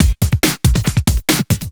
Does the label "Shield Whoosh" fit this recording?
No